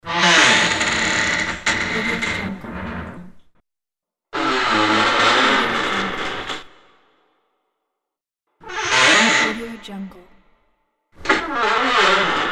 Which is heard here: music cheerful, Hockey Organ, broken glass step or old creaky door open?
old creaky door open